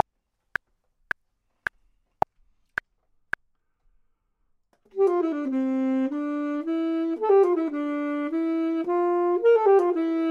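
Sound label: bottom, Saxophone and Clarinet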